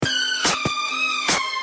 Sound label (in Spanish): Toxic Notificacion